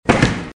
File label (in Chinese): peng zhuang; car